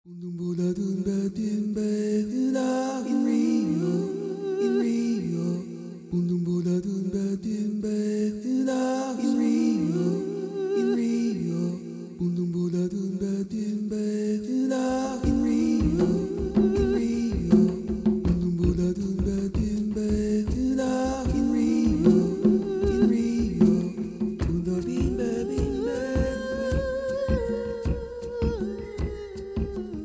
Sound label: CHANT